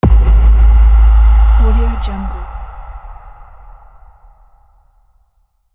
ghost hit

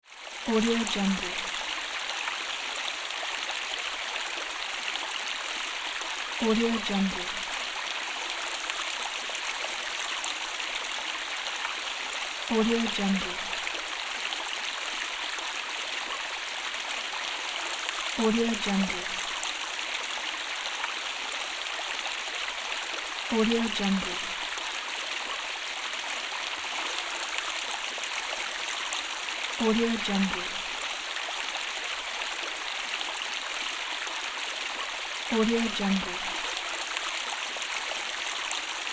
water flow

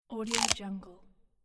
bite

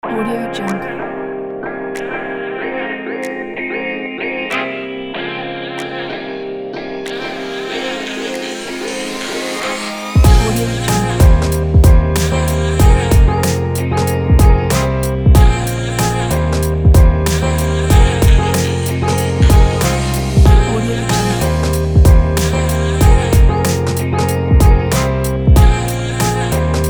hiphop

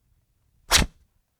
burlap rip slashing burlap with scissors scrape

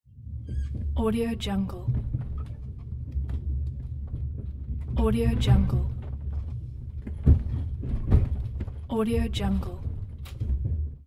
swinging train; jdproduction

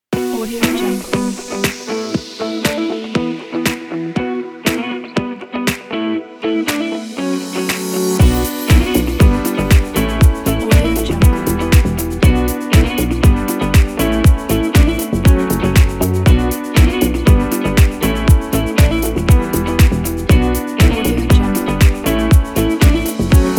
modern pop

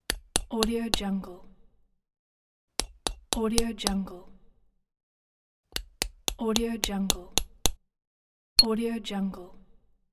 chisel hit

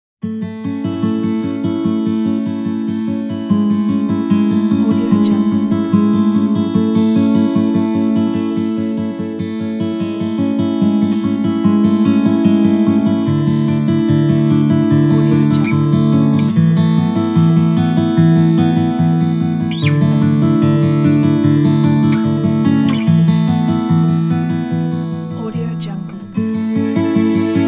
atmospheric ambient guitar

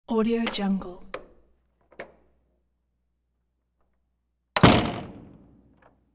metal door open and slam close

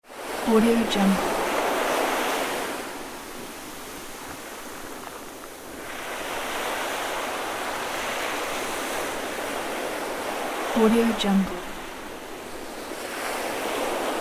gentle waves rippling on beach

